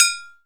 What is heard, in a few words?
percussion, Ethnic Perc